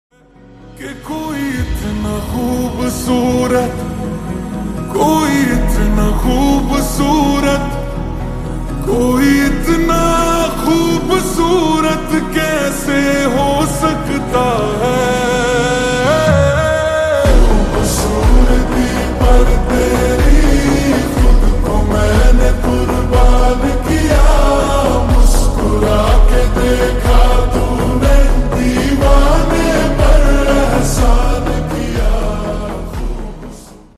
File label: Slowed Reverb Ringtone; stree 2